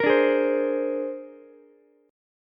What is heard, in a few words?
C 7th Suspended 2nd Sharp 5th, C, down, Ukulele; C7sus2#5 strummed; JGuitar